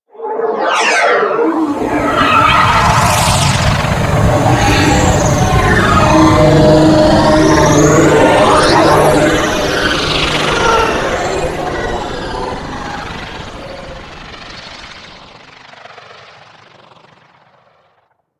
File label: Insect Liset Relay Undocking; Liset Flight